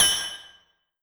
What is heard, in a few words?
synthesizer metal reverb